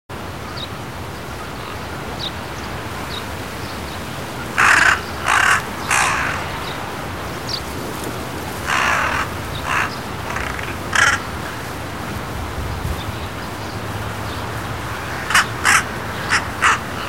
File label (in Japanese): hasibosogarasu; bird; azamidani